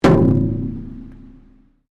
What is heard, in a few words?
METAL IMPACT BONG; Materials